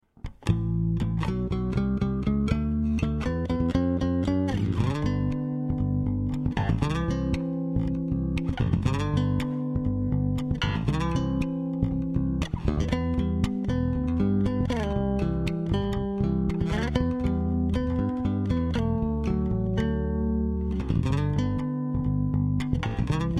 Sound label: finger style no drums, basses; gadow basses; rocket music